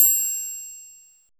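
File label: closed triangle open; Drums In Your FACE!; NEWJACK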